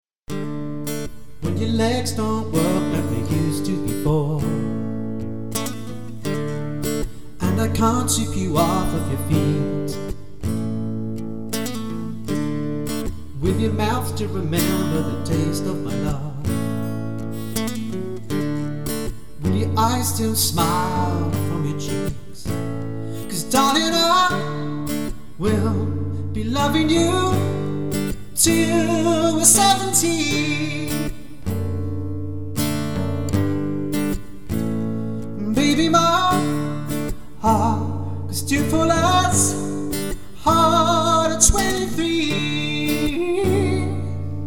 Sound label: guitarist